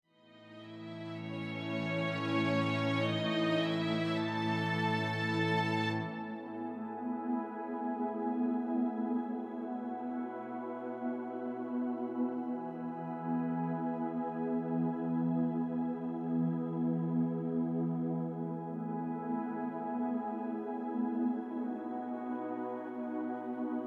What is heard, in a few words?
rhythm